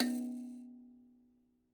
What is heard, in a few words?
Mbira B2, Kalimba, Kenya; Plucked Idiophones